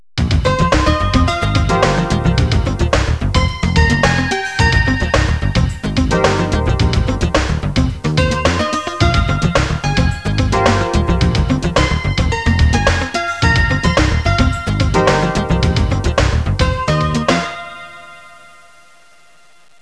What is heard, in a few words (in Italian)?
suonerie businessy